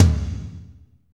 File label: tom, Power Ballad; Northstar; Drumscapes Roland